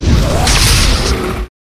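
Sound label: Dragon Skeleton bot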